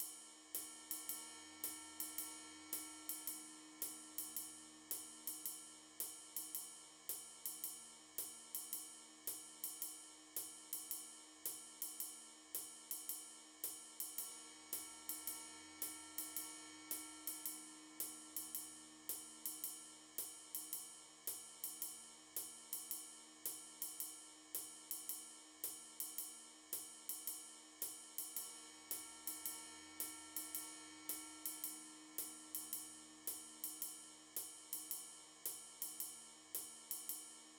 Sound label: Basic Swing, drum